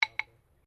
Clap Sticks (Bilma) Medium Double, Traditional Australian Aborigine rhythm sticks; Miscellaneous Musical Sound Effects